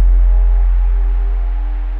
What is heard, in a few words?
Clicky Sub; Tree Tone Presets; Ableton; Factory Packs; Inspired by Nature by Dillon Bastan